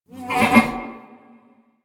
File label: Ram Grunt; Animals; DE; Warframe; Quests; Duviri